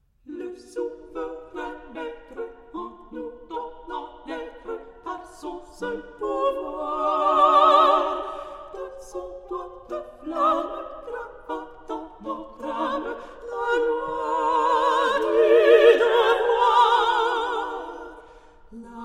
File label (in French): priere d enfants; meyerbeer